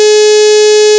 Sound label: Buzzer